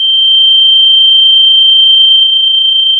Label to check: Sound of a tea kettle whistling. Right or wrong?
Right